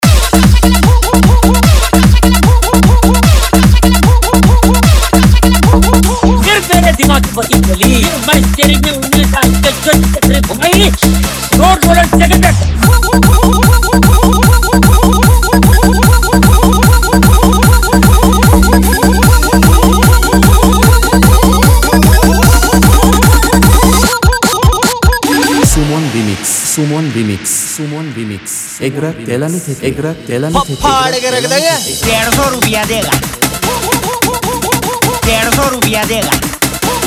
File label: Dance Music EDM Dance Dhamaka Dj Remix